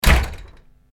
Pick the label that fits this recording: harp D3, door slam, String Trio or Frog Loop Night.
door slam